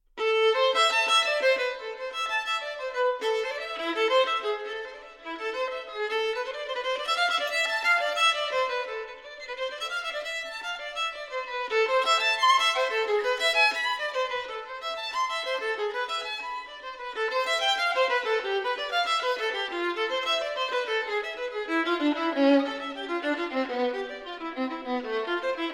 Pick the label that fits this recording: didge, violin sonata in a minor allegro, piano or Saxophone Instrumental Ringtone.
violin sonata in a minor allegro